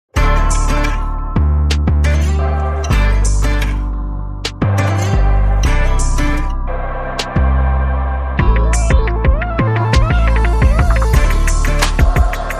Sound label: No Synth Lead